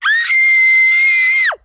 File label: Child Scream; Don't Be Afraid The First Toy